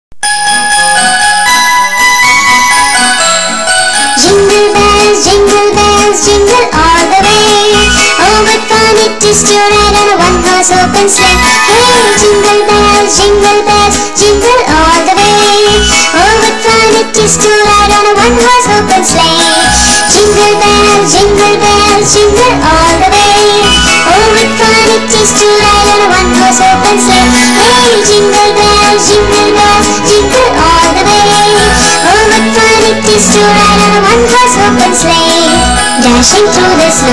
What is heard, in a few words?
JINGLE BELLS